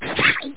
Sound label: flip